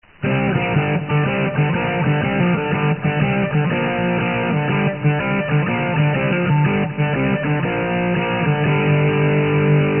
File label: rain